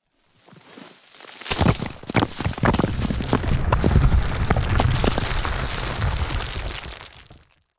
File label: nature landslide rocks